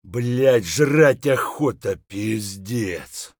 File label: tired, voice; Escape from Tarkov